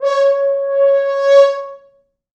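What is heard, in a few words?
4 French Horns; c#4; East West; Quantum Leap Horns Sax; 4 FrHorns fts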